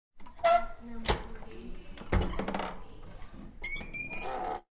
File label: squeaky door